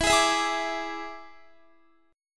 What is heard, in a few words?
E 7th E down Guitar; strummed